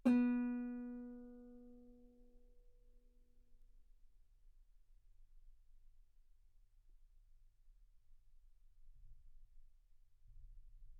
Guitar ff B3; sul B

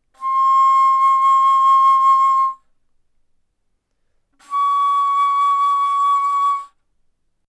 Bass Flute C6 Db6; ff

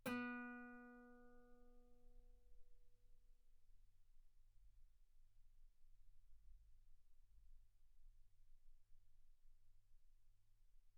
Guitar B3

